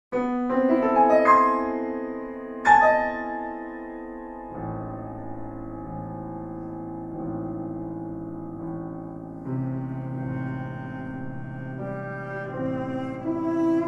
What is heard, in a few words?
viola piano lento